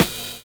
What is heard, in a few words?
BUZZ FILL; Univers Sons - Kitch 70s